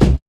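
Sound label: KICK, DRUM KITS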